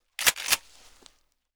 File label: rem870 pump end, shotgun; weapons; garrysmod